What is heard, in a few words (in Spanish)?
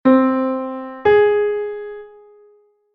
6ª Menor Do3 Lab3; intervalos musicales; ¿Qué intervalo suena?; 6ª menor ascendente